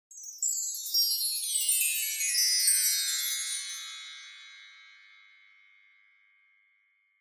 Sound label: Wind Chimes Down Wide